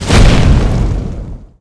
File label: Triple Hit